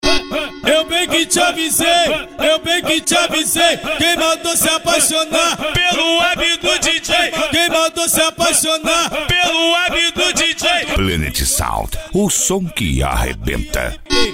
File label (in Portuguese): funk ritmado; cuiaba mt